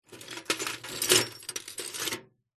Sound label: glass pieces broken rummage in metal container; zapsplat